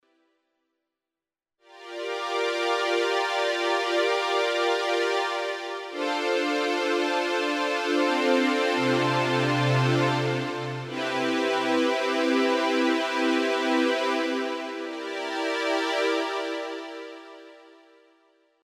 RK100S Synth Strings

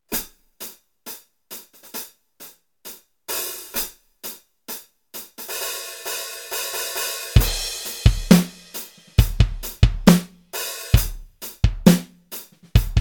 Millenium MPS 1000 Studio Hi Hat Calibration

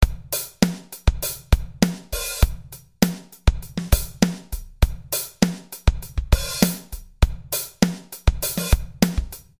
Drumgroove Compressor Drumbox

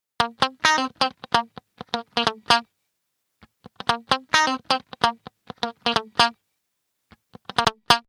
Funky Git

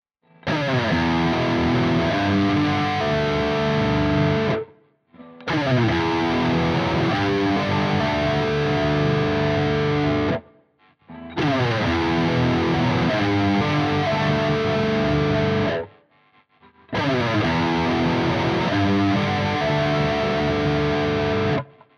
AxisFlanger lead; all on; Speed 5

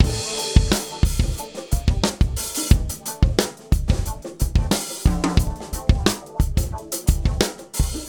WA 2A Drums Limit

